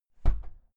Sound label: entrance door close gently outside